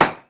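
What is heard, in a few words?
crossbow hit; weapons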